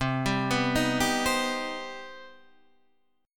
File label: C Minor Major 7th C; arpeggiated; JGuitar